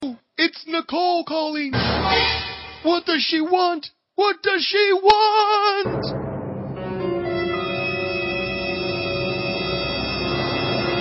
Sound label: Is Calling RNB